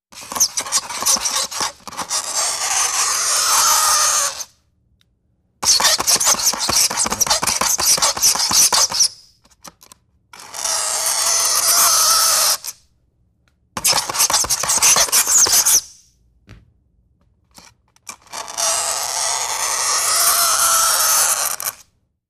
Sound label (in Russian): zvuk skrejeta nogtyami po poverhnosti